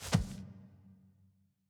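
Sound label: ambience room polygon punchbag training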